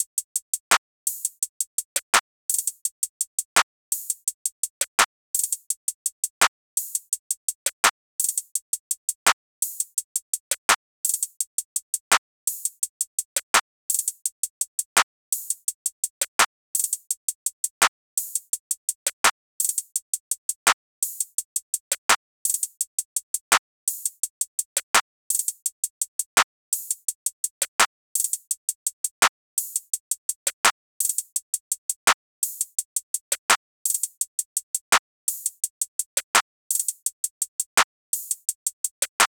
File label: drums